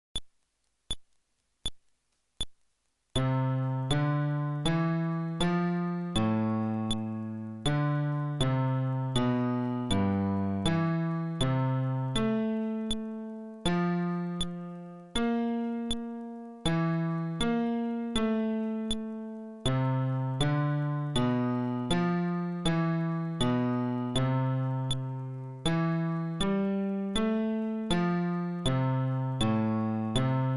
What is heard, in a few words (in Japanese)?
フレットレス (テンポ80)